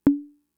Conga, Fricke MFB-522

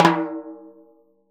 TIMBHI, Synclavier Percussion; ILIO; Synclavier Percussion 2; World & Orchestral

